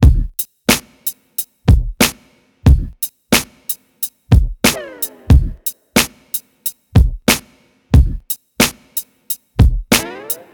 90 bpm modern drum beat D# key, Free breakbeat - kick tuned to the D# note. Loudest frequency: 2829Hz